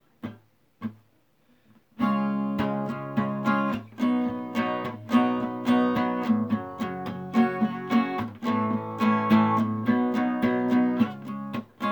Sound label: music acoustic; swoop internet